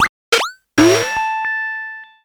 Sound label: Character Attack